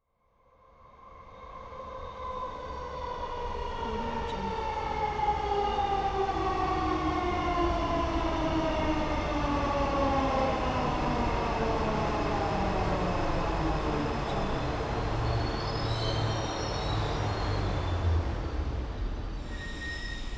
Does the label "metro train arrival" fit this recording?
Yes